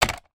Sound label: toy plastic motorbike put down on table